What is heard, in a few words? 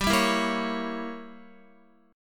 G Diminished 7th, Guitar; Gdim7 strummed; x,10,7,9,10,9